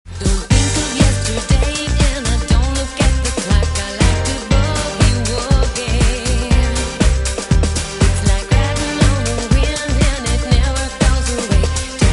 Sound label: deeper music